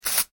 household aerosol can spray; Spraying; Objects; McKinney Sound

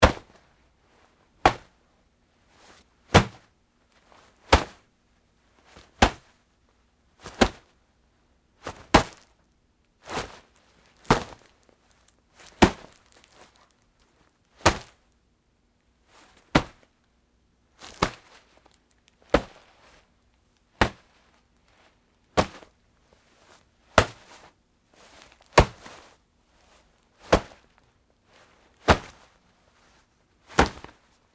Foley Fight Moves Nylon Shorts Grabs; Wrestling and Struggling; Big Room Sound

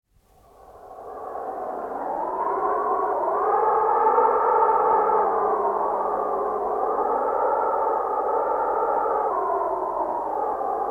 Arctic wind; Snow; Weather; BBC

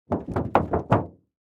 Impact Automobile Hood, Buckle; Crashes; Objects

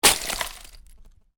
Ice impact medium, Ice: medium impact with debris; Snow; Weather; Stuart Duffield

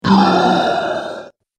Zombie Death Breath; horror; zomby

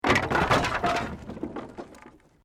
Wood Collapse

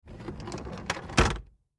Computer Desk; Computer Desk Keyboard Stand Push In; Typing; Office; Blastwave

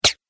Silencer Gun 1 Hit, gun shot

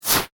Thwip Fast Whoosh

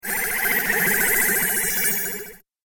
Ufo Magic; Spacecraft Sound Effects; Andrew Fleming

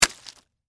Stab Cabbage Head, Hit, Vegetable; Texture; Food Sound Effects

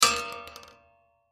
Bullet Shell; Bullet Shell 12 Gauge Drop Metal Catwalk; Blastwave; Warfare

